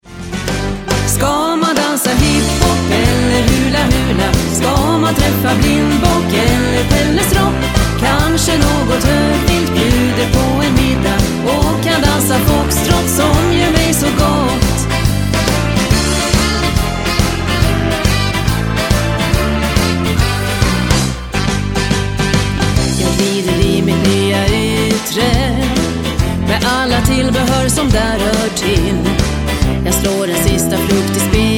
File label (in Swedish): Hip hop eller hula hulaprov